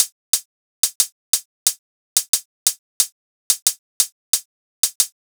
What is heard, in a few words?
Hi Hat